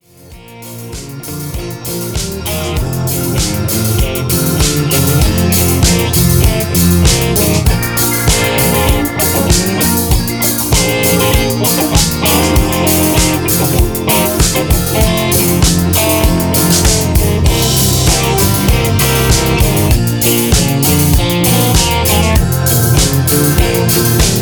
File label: Disco Blues in F